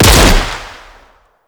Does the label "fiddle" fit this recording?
No